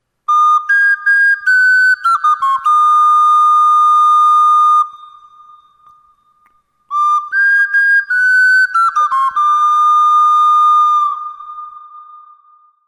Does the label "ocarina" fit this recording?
Yes